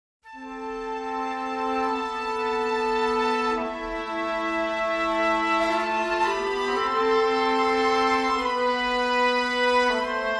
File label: Brass and Woodwind